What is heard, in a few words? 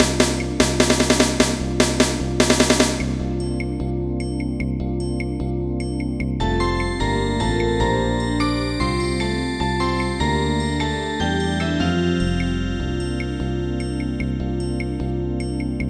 DRM